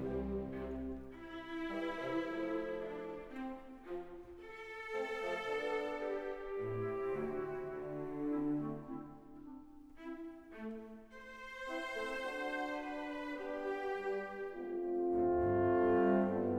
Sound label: Enigma Variations, for orchestra, Andantino; Elgar; 6． (Ysobel．)